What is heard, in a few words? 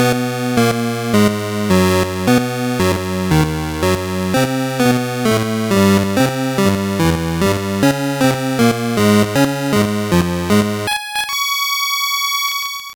Ditty; NBA in the Zone 1999; gbc